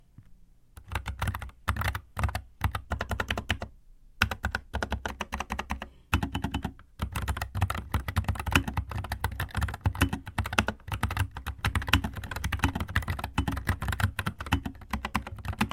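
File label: keyboard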